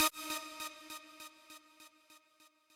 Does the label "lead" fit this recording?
Yes